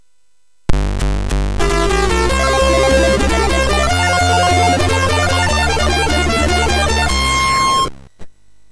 Music Demo Version, MOS6581R3; hvsc

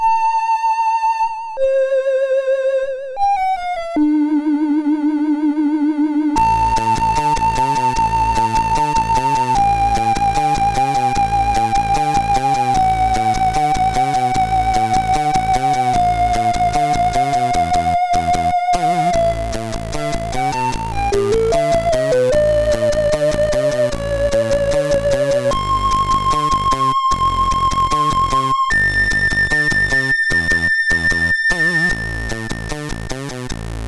Weird sid MOS6581R3

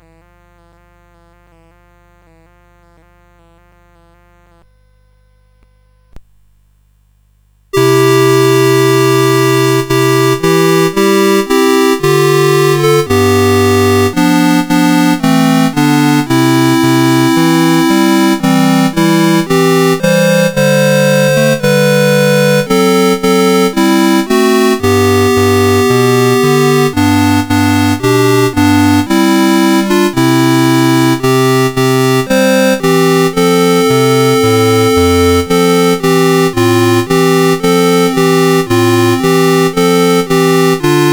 Onward Christian Soldiers, CSG8580R5

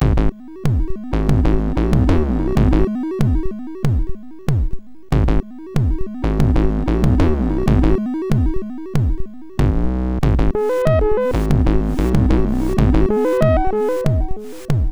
sid MOS6581; hvsc

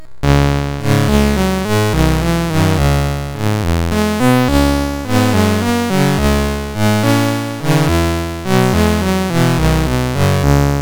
Scarborough Fair, sid MOS6581R4

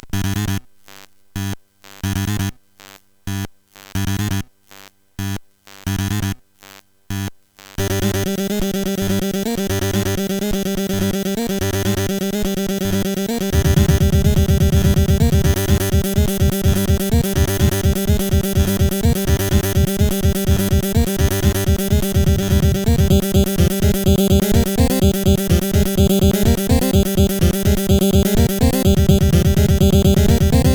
Fast Techno, CSG8580R5

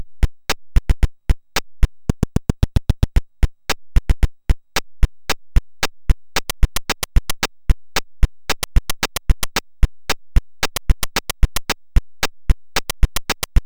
Digi Drum, MOS6581R3; Mini Digi Drum 512 bytes BASIC

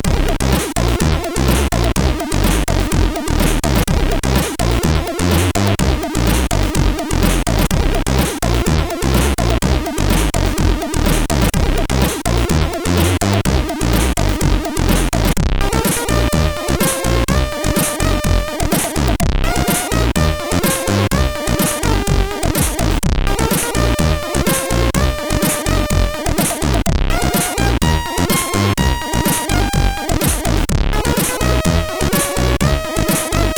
Funk, sid CSG8580R5

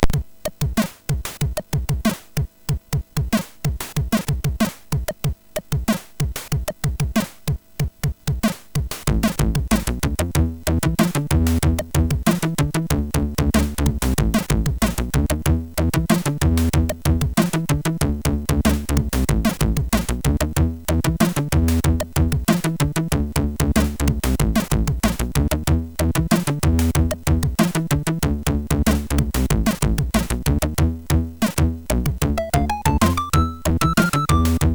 Arabian Song, MOS 6581

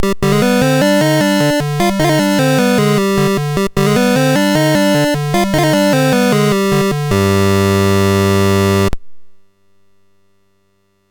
Dragonworld sid MOS6581R4

